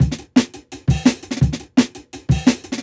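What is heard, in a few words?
Jungle Breaks, 170 bpm; Original Jungle Breaks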